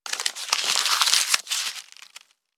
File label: Thin paper cumple rustle